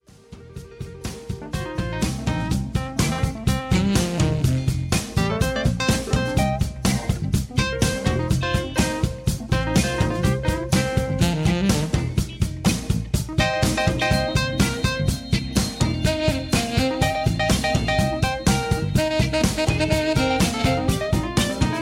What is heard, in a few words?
instrumental